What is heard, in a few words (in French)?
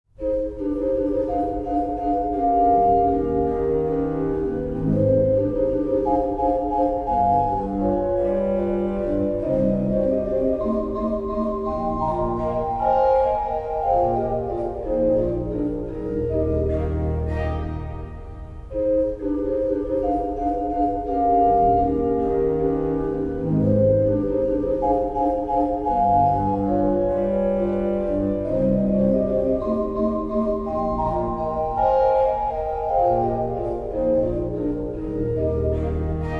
valse de ballet, orgel; hofkirche